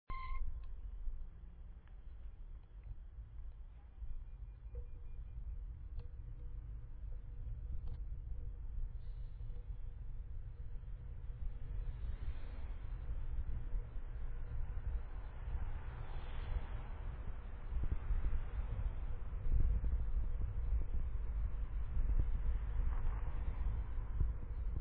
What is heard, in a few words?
waren soundscape